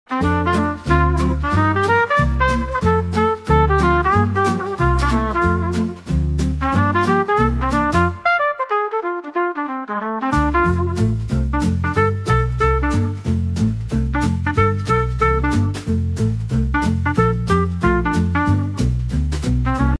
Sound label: Jazz music, بی کلام